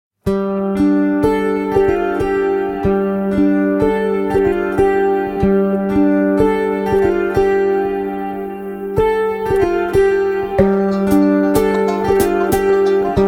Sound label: Very Very Sad BGM